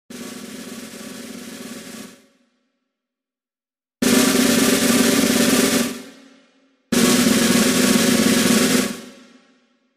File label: Snare roll